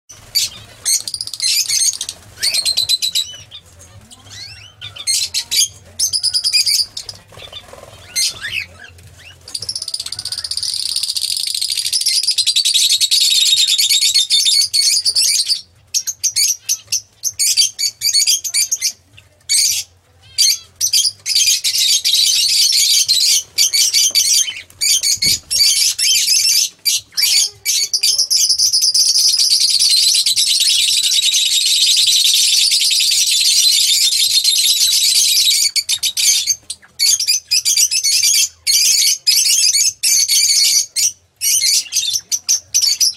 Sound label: lovebird